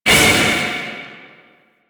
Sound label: Void Release Blunt; Weapons; Warframe; Duviri; Incarnon Adapters